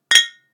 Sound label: cheers bottle one Ab Bb